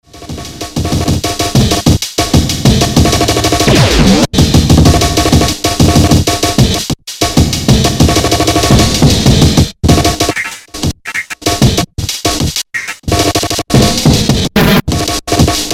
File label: filtered drum loop; evil old man